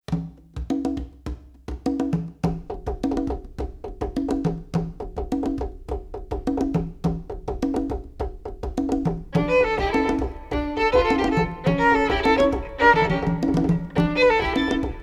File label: Cuban Blues